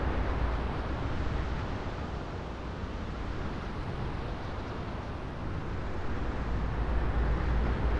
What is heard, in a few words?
Wind Cast Loop, Magic Sounds; Fantasy Sounds Bundle